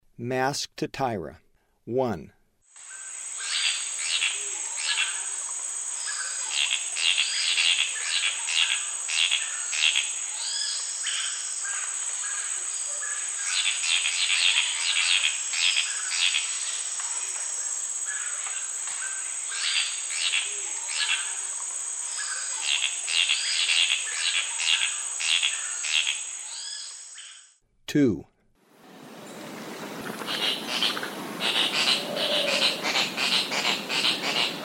Masked Tityra; S. America; Eastern Lowlands

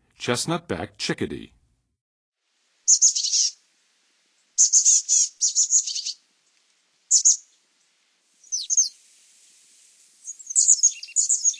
Chestnut-backed Chickadee; North America; Western USA; Western USA Songbirds

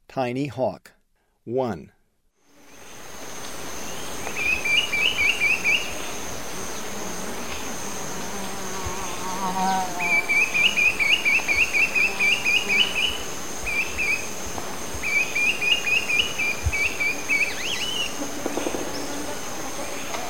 Tiny Hawk; S. America; Eastern Lowlands

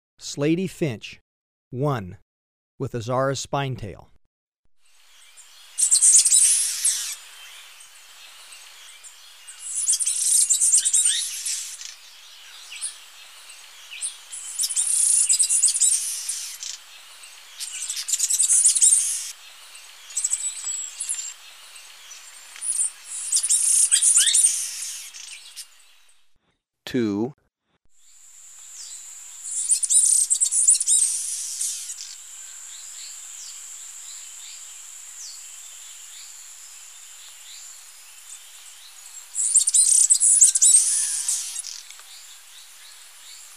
Slaty Finch, Birds of Ecuador; S. America

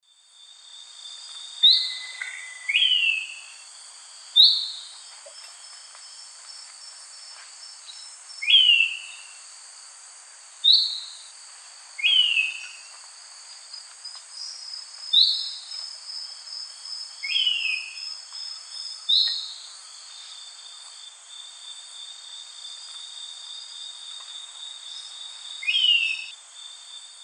Icterus chrysocephalus, calls or song; S. America; Eastern Lowlands

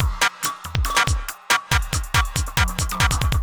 ind 140 kit

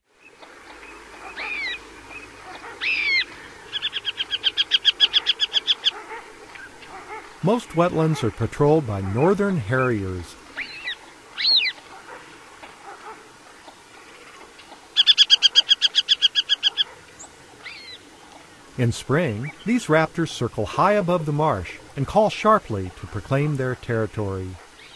Northern Harrier; North America; Common Western Species; Know Your Bird Sounds

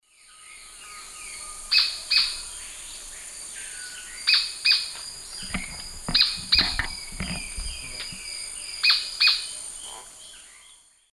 Liosceles thoracicus, call; S. America; Eastern Lowlands

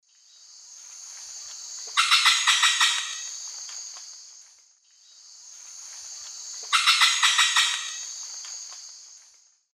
Psarocolius decumanus, call; S. America; Eastern Lowlands

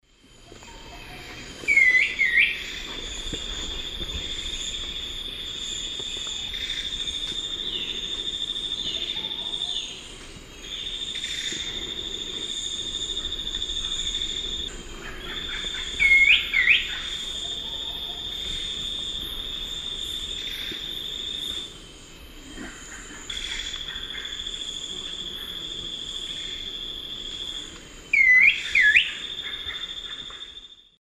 Chiroxiphia pareola, call; S. America; Eastern Lowlands

